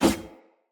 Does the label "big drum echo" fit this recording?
No